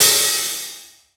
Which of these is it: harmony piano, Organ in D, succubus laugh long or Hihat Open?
Hihat Open